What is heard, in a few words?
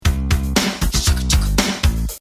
Bass Notes; minor scale real songs quiz